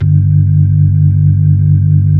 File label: B-3, Keyboards of The 60's and 70's; Club Xfade